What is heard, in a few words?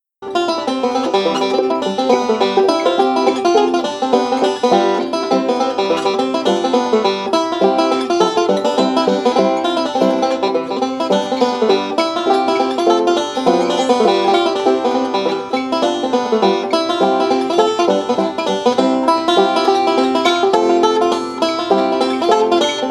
Banjo, Ricketts Hornpipe; Grey Fox 2015